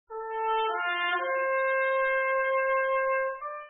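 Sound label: trumpet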